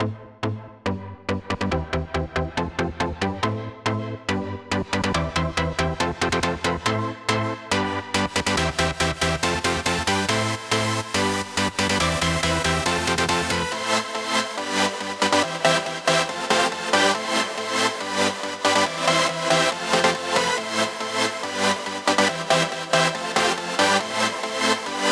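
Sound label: 140 BPM Chords sidechain; Vengeance - Essential Clubsounds Vol.5; Demo Constr Kits; Nessi Kit Root A; Synth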